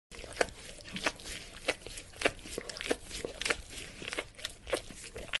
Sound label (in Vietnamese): tiếng chó liếm